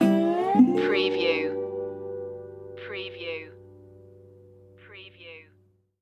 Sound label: star spangled blues stinger